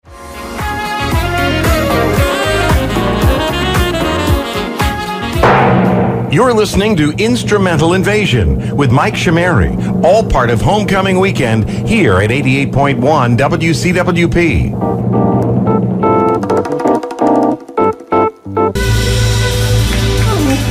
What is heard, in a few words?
instrumental fm stream transitions; 2018 live show transitions